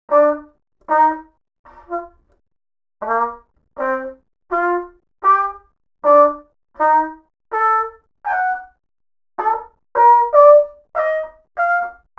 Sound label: Trumpet; leisure suit larry magna cum laude